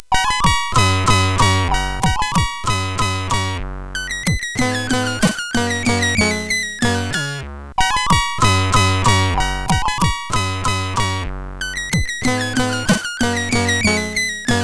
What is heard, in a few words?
German Dances, CSG8580R5; hvsc